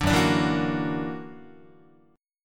Gb Augmented 7th Gb down Guitar 4A,4E,5A,5D,5G sharp,6C sharp; Gb+7 strummed